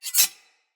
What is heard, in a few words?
Sword Slice Accent; Weapons; Garren Sword